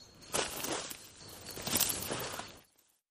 transition; Sheriff Of Nottingham

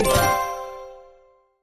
fishing sign collect; Fishing For Gold

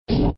GAMBLE END; Always Hot Cubes

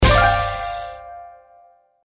hit; YunCaiTongZi

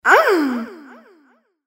moan; Imperial Girls

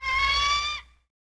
symbol eagle; games; Aztec Gold Megaways

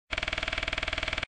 GAMBLE CARD BLINK; Helena

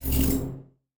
spin start; Great Book Of Magic Deluxe; Wazdan

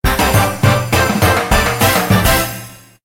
win big; Ultra Sevens

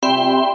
bonus chance; Lucky Zodiac

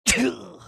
character lion fishing; Fishing For Gold

